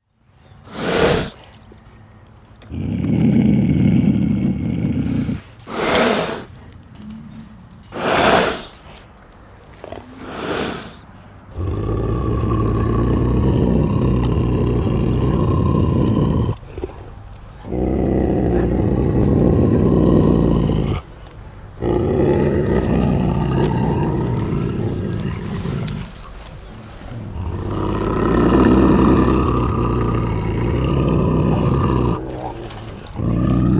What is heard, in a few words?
Hissing puma